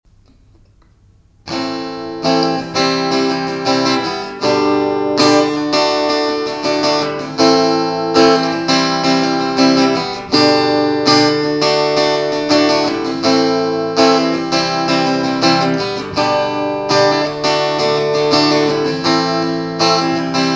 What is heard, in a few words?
Chord Progression Electric